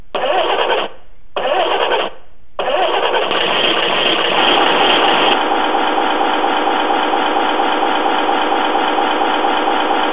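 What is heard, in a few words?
rm horn, railbus; AEC Railcar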